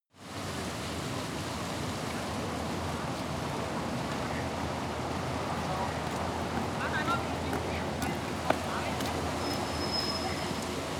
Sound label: rain marketplace traffic close people